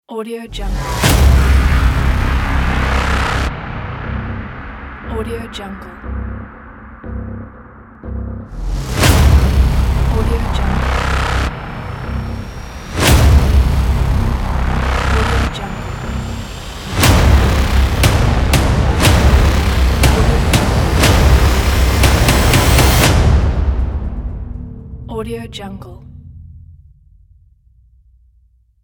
epic hybrid logo

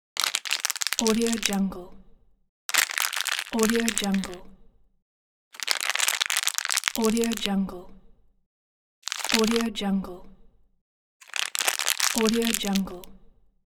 creasing rustle plastic package

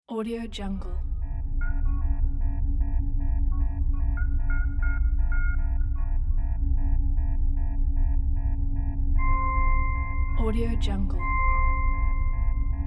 derelict spaceship flight deck ambience

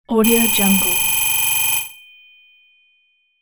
telephone ring

